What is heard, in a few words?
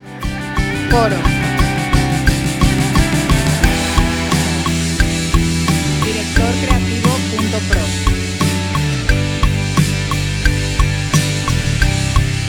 88bpm 4/4